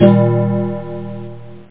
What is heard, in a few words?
Chime MAJ 6th